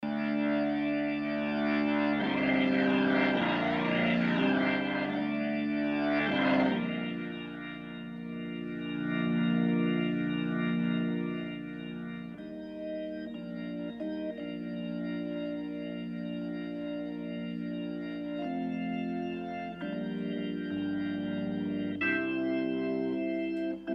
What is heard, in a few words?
Rough Start, Hammond T522; Starts with medium overdrive gain and then lowers gain. Notice how the key click gets accentuated.